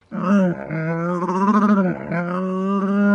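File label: camel sound short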